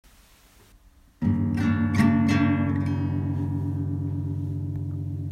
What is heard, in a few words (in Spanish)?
Guitarra